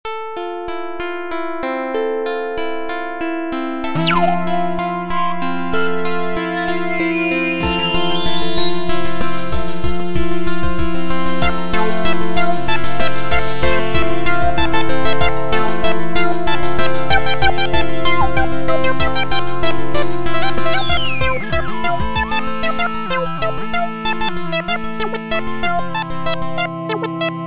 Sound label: Overgrown Windchime